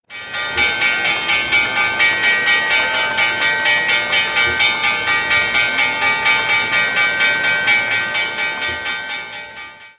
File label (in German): wall street glocke